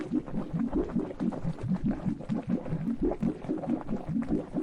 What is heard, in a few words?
slime dance